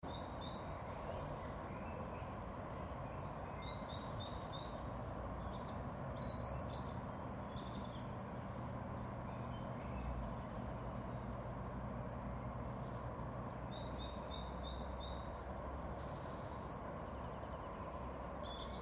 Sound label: Birds Distant Highway Spring, Various city birds singing with distant, even traffic ambience; Some perception of wind.; Outdoor Sound Effects